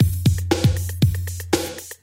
Drum loops Electro

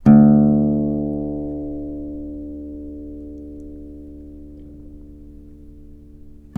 longing, Notes, Guitar

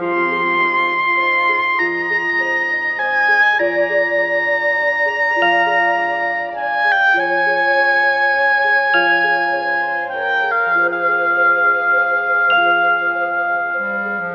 sax, Sad

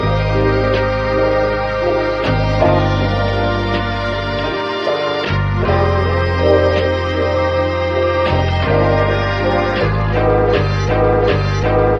strings, loops, phonky

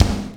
Kicks Loud

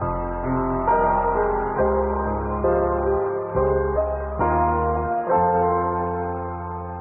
piano, sad loop

